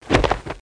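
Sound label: body hit; duke nukem total meltdown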